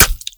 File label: Slashing; don t be afraid the first toy